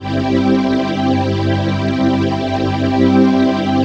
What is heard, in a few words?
PHASE PAD, ATMO PADS; Best Service; XX Large